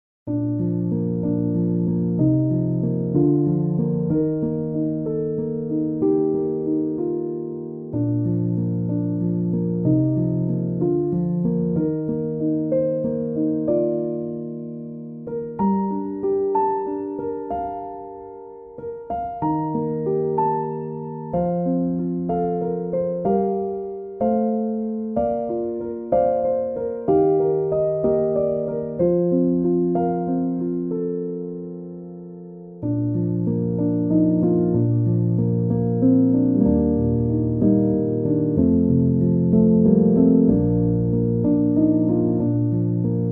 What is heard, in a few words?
Piano